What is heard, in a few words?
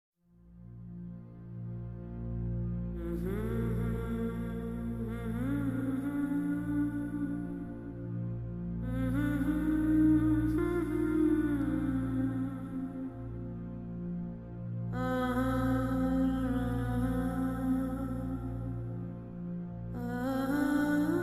Prayer